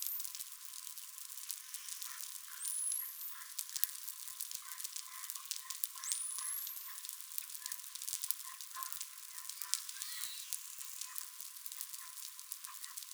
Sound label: Risso clic